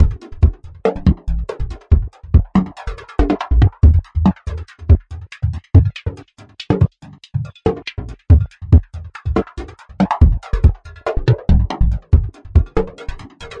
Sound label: 141 lowpass, DRUM LOOPS and BREAKS; 141 to 160 bpm